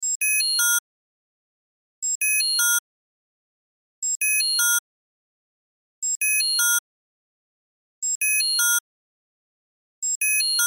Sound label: Cell Alarm Low, Cell Alarm Low Battery Chime Loop; Technology; Alarm Sound Effects